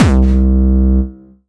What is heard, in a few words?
Distortion Kicks, Bassdrums